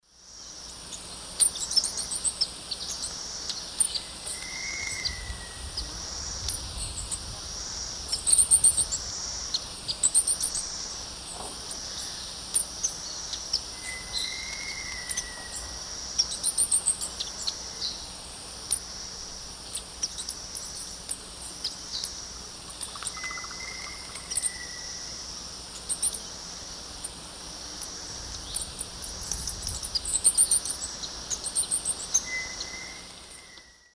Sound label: Tangara callophrys, calls and song; S. America; Eastern Lowlands